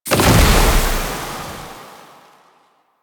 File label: Alchemy Grenade Explosion Toxin; Warframe; Entrati